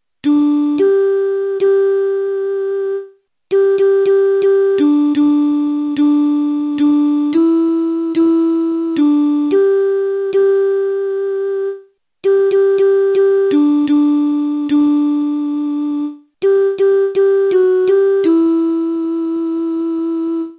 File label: alto